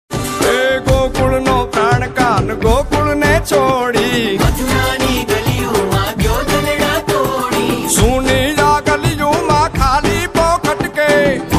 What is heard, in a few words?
Krishna gujarati ringtone